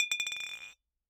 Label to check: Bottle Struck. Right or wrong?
Right